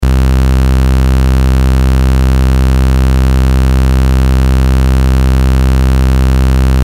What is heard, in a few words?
vco saw wave, modular synth; Grundwellen vom VCO; Saegezahn